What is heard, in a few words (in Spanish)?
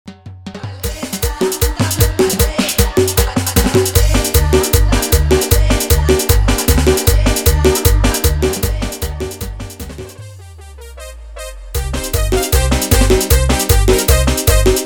Instrumental